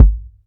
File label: BD, Bassdrums, Roland TD-20